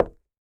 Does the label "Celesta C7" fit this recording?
No